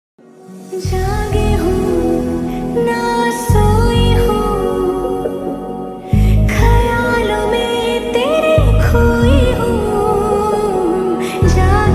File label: ringtone love ringtone romantic ringtone